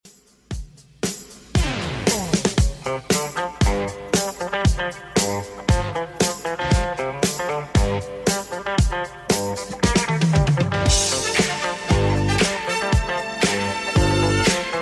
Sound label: 80s Redrum 116bpm